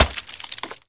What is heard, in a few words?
ice shatter